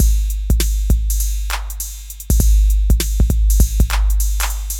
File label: drloop, drumloops, Vinyl Attack; Masterbits